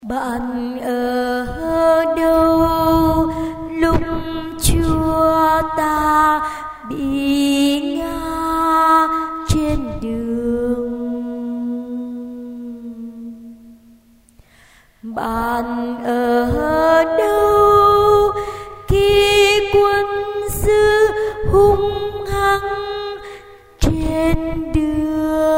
alto

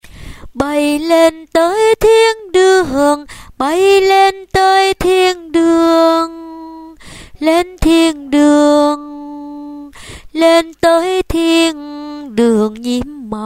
basso

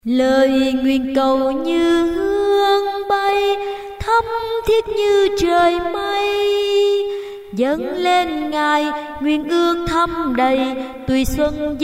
sop alto

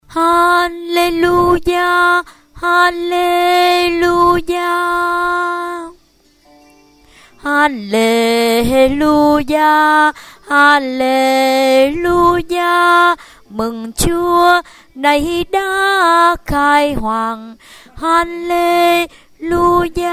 basso

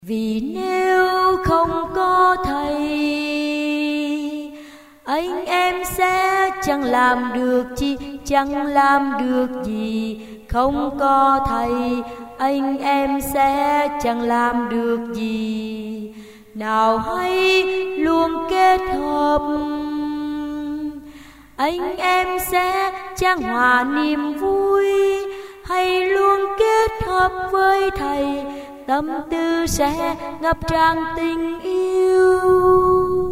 alto

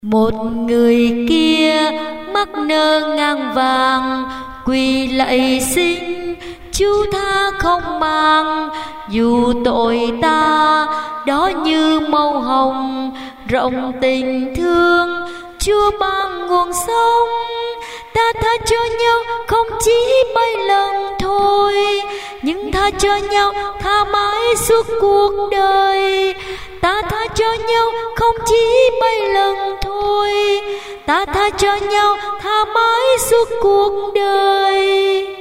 tenor basso

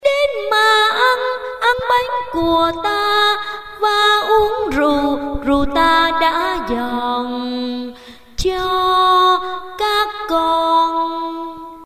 tenor basso

